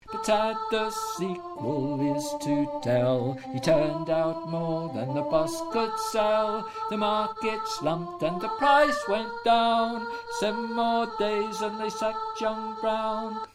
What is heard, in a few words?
Tenor Voice